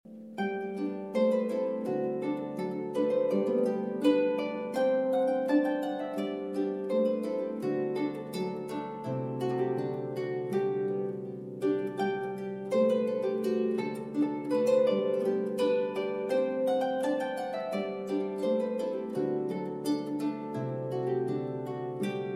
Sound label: Scottish tune; Folk